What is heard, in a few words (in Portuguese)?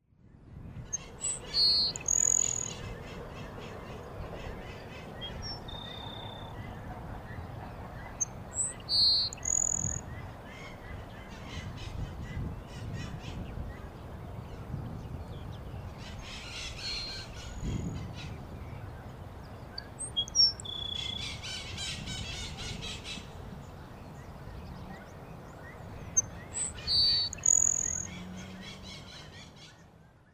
Tico-tico-do-campo Ammodramus humeralis; Jacauna